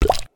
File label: piggy plop